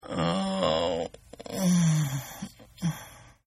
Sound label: Moaning Male; Groaning; Sound Effects:Voice